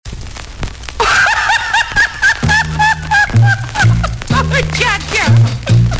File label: Funny Laugh